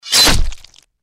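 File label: deathclaw claw attack; npc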